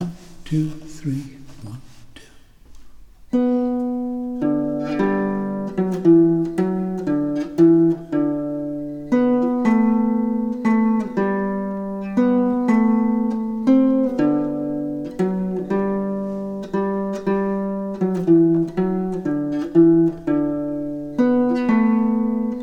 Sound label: Planxty Irwin - Baritone practice file